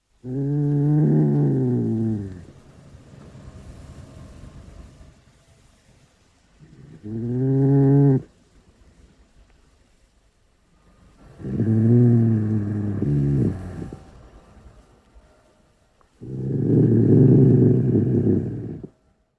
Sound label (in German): Dachs Meles meles Knurren